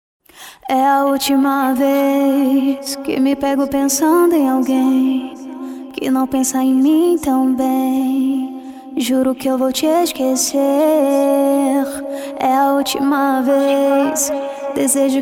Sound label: ACAPELLA; TECNOMELODY